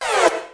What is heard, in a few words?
smatter zap